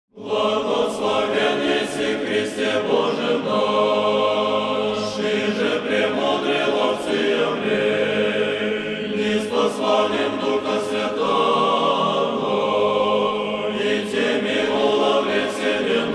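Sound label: Male choir - Troparion and kontakion; Muzhskoj hor Minskoj duhovnoj seminarii; prazdnika Pyatidesyatnicy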